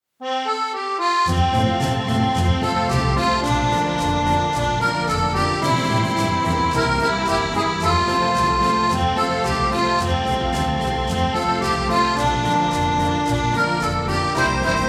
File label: Jingle Bells Jolly Accordion Music